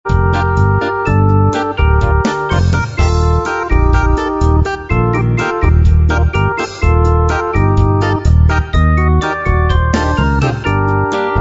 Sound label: Quirky Mambo